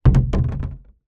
industrial container large plastic empty knock over; zapsplat